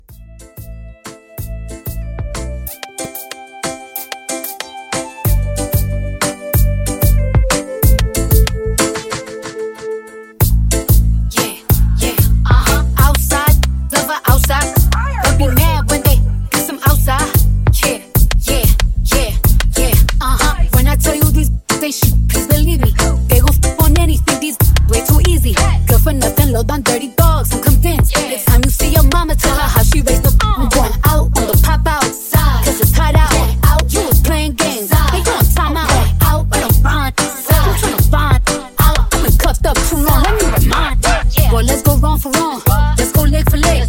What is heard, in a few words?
Mashup 93